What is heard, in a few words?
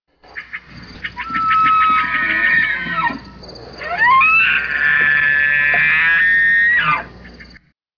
Elk Bugle